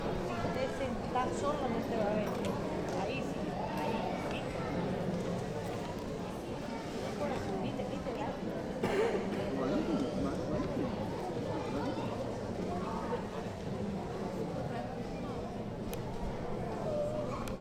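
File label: Auditorium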